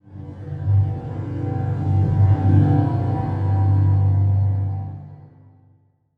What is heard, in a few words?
Title Transition Stab Dark Mysterious; Production Element; AdobeCC